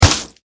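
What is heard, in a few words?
foley splat blood heavy; imp audio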